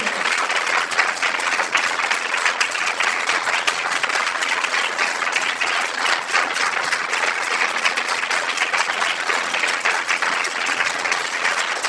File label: applause